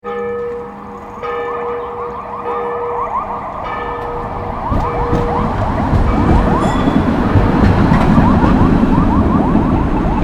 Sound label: wroclaw impressions